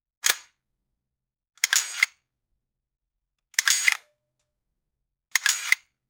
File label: minigun spin up; preparing